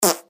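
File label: normal hit whistle; boop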